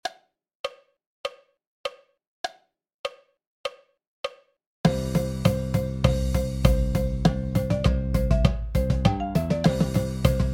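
100 BPM Paradiddle Breakdown with countoff, Marching Percussion; Tutorial Level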